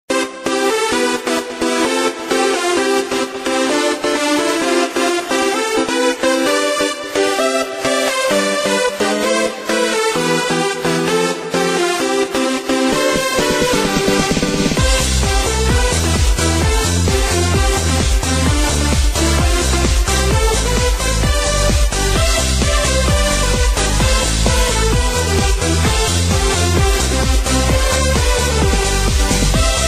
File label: techno ussr